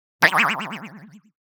Impact Comic Spring Hit Thin; Hollywood Edge; sound designer's toolkit v2

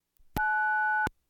Household Phones

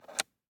DICE on Plastic, Grab, Standard, 2 Two Dice; Samples; ArticulatedSounds; DICE Sfx Party (Extended)

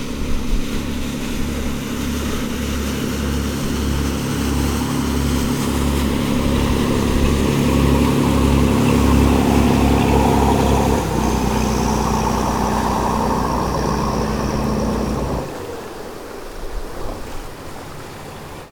boat motorboat cutter; best service Blue Box; sea traffic

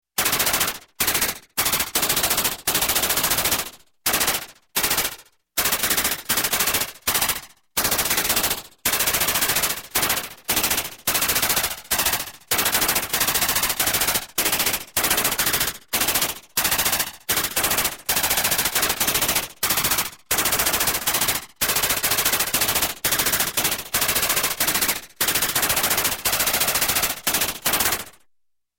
Space Machine Gun, Electronic; Sound Ideas 2000 Series

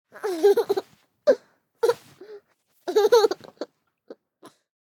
BABIES LAUGH Girl 24 months Giggling Moving Close; Baby Boom

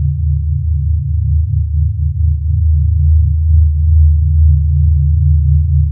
Sci-fi Magnetic Energy Loop; SFX Kit; Sci-Fi Loops

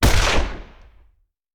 Rifle; Sound Ideas; Guns

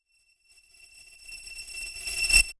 Sound Design, Riser, High Pitch; Blind Drive

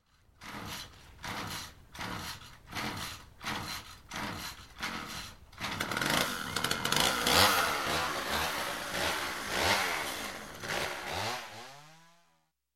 Chainsaw Hard To Start, Start, Run, Logging; Sound Ideas 2000 Series

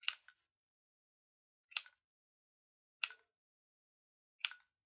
Generic Click Metal Small

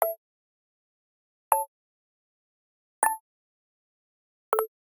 Digital Click Neutral

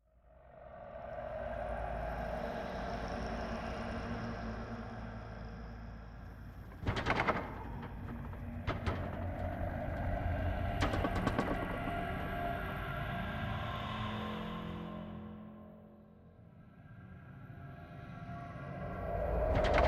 Sea Below Deck Center

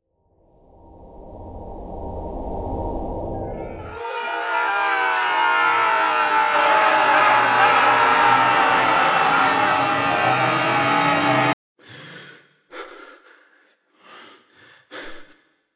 Corpses

